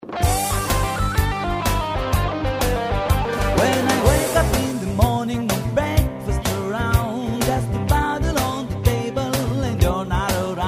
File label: Breakfast Cover, Blues Traditional; Old Recs